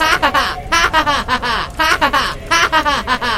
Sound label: harharharharharharh